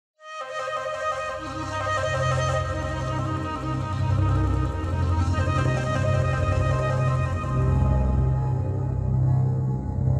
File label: Atmospheric Mystery Flute